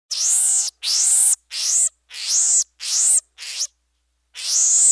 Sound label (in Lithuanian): Nykstukine marmozete